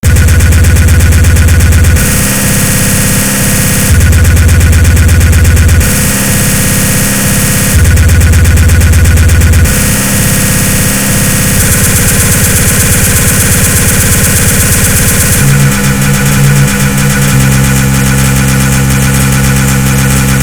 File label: Cowbell